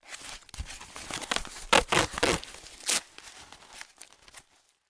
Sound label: CLOSING BOX TAPE; Don't Be Afraid The First Toy